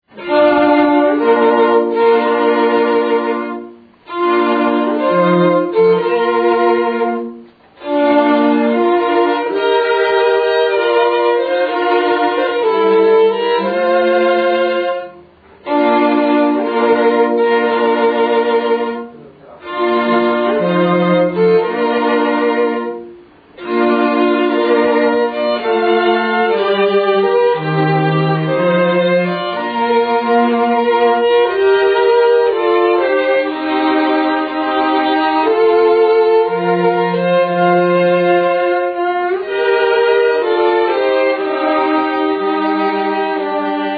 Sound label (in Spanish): marcha cuerdas; coros solistas; wagner